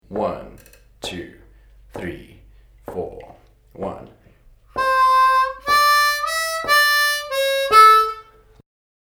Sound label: Lick, harmonica